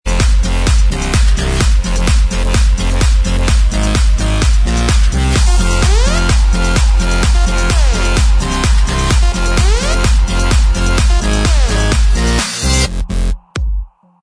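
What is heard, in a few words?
instrumental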